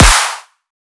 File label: kickclap STOMP; drums; base pack